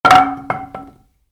Baseball Bat: Dropped Onto Carpet; Sports; Stuart Duffield

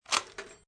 Load, Gun Handling; VRSonic; Guns

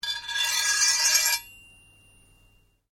Blade Scrape Slow Ring, Sword; Foley sounds for melee weapons; Swords (Sound Effects:Combat)

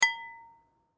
Glass Lamp Flick Ding; Lights; Foley

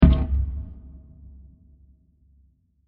Deep Drop; Blasts; Impacts; CraftedSignals

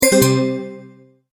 multimedia tone, Goodbye; Tones (Sound Effects:Sound Design); McKinney Sound

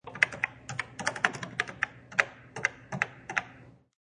Typing on a cash register; Cash Register Sound Effects; Bars

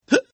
Hiccup; Human; Hiccup 3; Hiccuping; Hiccupping

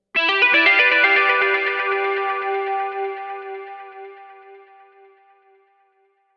Electric guitar tapping effect transition; Guitars; Musical; Guitar Tapper

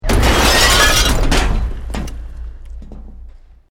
EXPLOSION MEDIUM BRICK WOOD GLASS NO FIRE

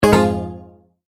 multimedia tone error

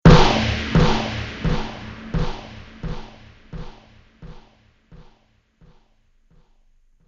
Slow Motion Delay Gravity

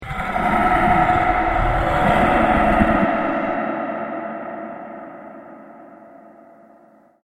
Den Of Dragons, monster sound effect; fantasy

